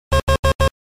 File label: ra alarm; svencoop; Angel